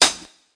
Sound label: dirty hh Air Hat, Dirty Hip Hop Kit; STYLE KITS (Hip Hop, Etc)